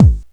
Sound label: KICK, Hip Hop